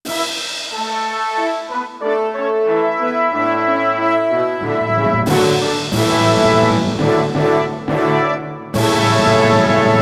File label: VS Battle Fanfare